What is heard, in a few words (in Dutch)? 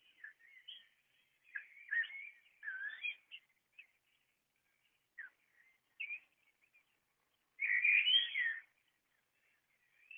merel met uithaal